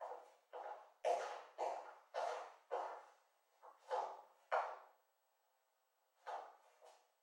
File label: Ambience Footsteps; Deployment